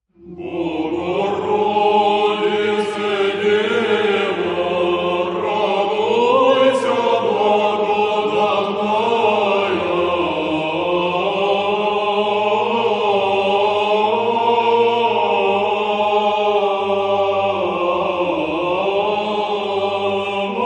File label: Ave Maria russian